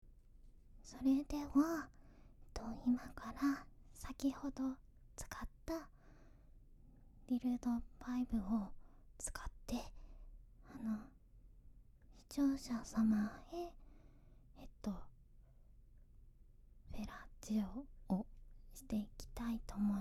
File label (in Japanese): フェラチオ, ASMR